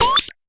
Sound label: fx drum